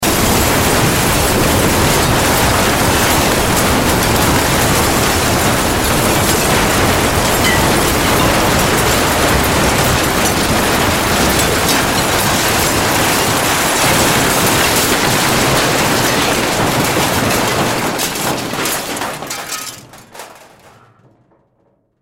Destruction Metal Glass Rock Wood Debris Large; Crashes; Crash Massive Destruction Metal Glass Wood Debris Large; Blastwave

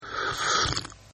Slurp, Drinking; Human

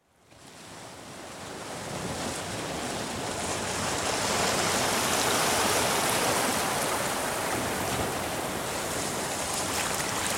Seawash, rising tide on rocks; Part of Ocean (Sound Effects:Nature); BBC

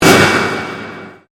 Convolution Pulse

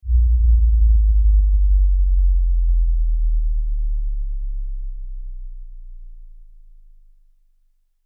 Lfe Descend Low Bass; Descending

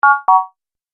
Multimedia Completed, beep; Andrew Fleming

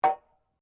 SLEDGEHAMMER METAL IMPACT LIGHT; Working with a sledgehammer; Hammers; Tools

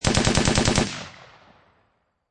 Machine Gun Ak-47 Long Burst; Machine Gun Sound Effects; Machine Guns (Sound Effects:Guns)

